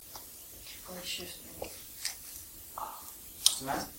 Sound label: breath ah